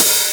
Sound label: Cymbals HH Open; Vengeance Samples